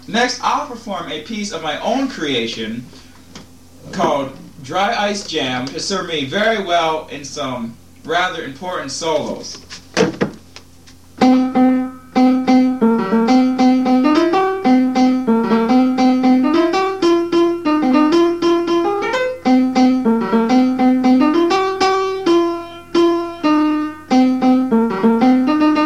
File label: dry ice jam, blues and bass